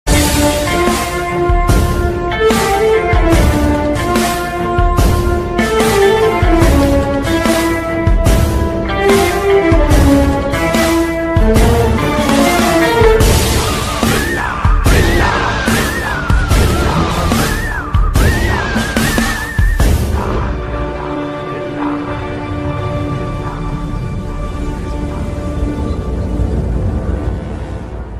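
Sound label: background music ringtone, Tamil; billa; billa 2; kt creation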